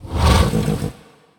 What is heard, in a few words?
horse idle; fauna